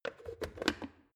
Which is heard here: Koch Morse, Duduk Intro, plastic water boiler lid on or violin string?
plastic water boiler lid on